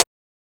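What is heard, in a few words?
house hi hat; E-Lab; X Static Goldmine 1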